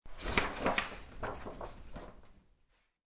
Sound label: Paper Rustle, Paper rustling sounds; Classroom; Schools